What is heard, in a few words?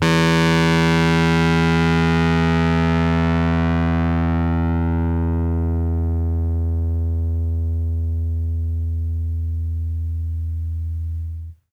RHODES FUZZ